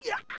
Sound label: Choke; OOT; Adult Link